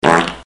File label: fart short